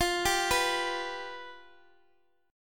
F Suspended 2nd Flat 5th, F, Guitar, Half Step Down